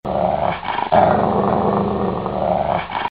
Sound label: Dog Growl Sms